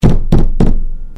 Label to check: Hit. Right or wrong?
Wrong